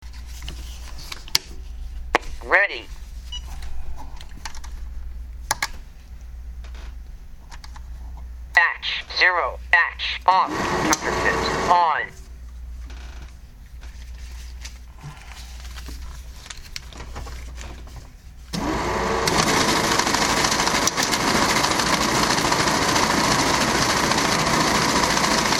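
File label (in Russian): мой говорящий счетчик денег немного отличается от того что я записал на машине; moj govoryashij schetchik deneg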